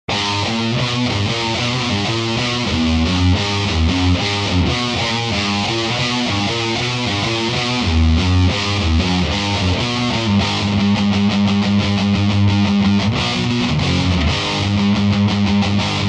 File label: badcat double gain